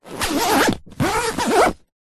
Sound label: foley suitcase zipper